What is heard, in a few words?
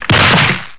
ground hit